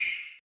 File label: Chime; Touhou SFX; Enemy Bullet